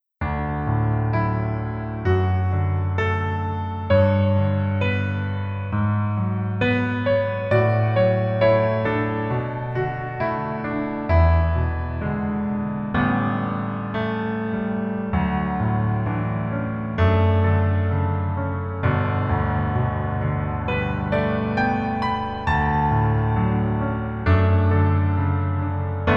Piano ver 멜로디 MR 남자 키; 슬기로운 의사생활

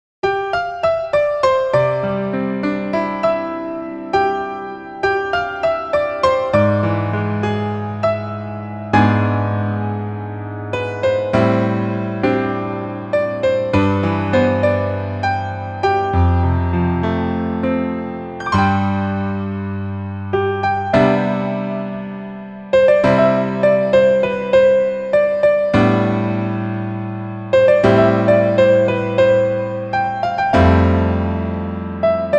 Piano ver 멜로디MR

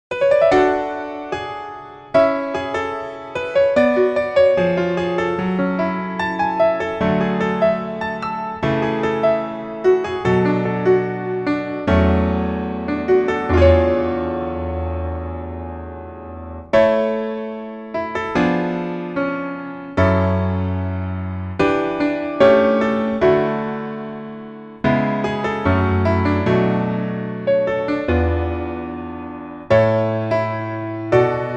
Piano ver, 남자키

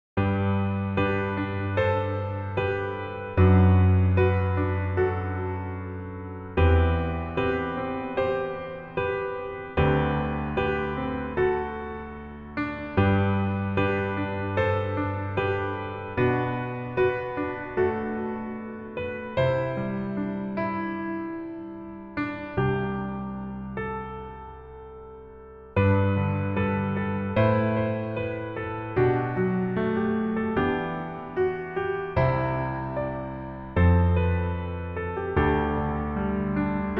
Piano 멜로디 MR

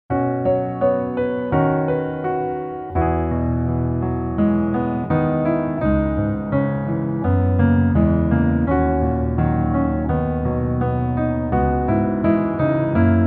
Piano ver -1키

